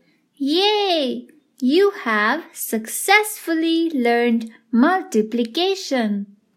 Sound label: yayy; grade1; multiplication